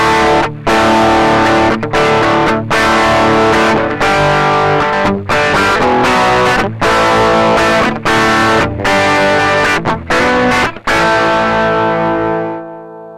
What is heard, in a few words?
classic hard rock, Distortion